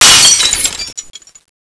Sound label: glass impact; infection